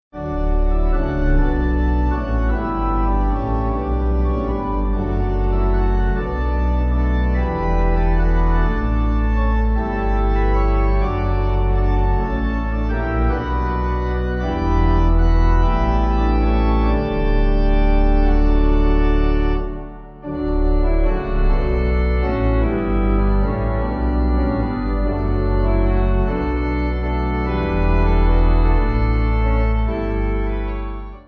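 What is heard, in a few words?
Pipe, hymn backing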